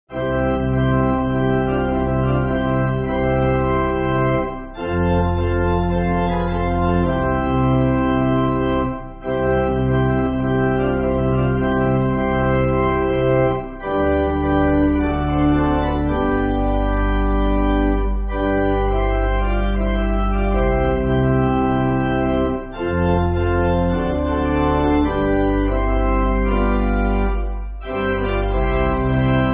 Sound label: Pipe, church music